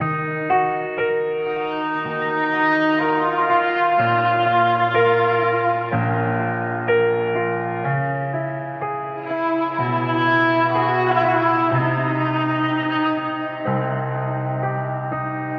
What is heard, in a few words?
piano strings, Sad; loops